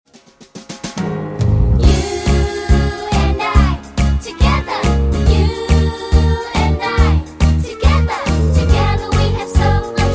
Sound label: Karaoke